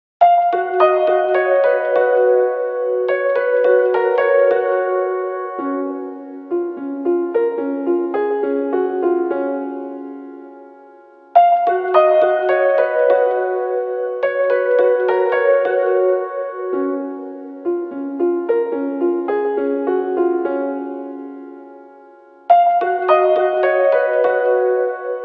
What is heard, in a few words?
piano